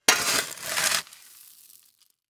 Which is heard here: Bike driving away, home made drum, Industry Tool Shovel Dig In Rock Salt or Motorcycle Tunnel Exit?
Industry Tool Shovel Dig In Rock Salt